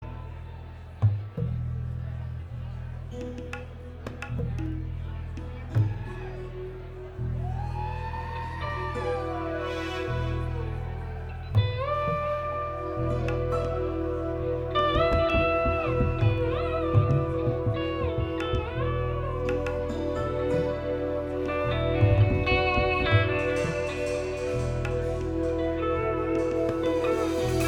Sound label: Improv Jam